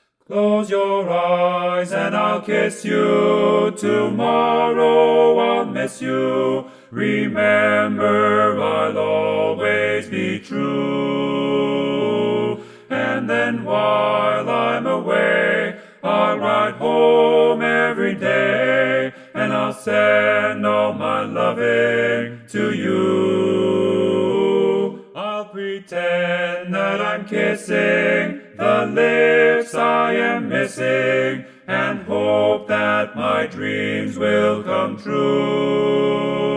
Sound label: Baritone right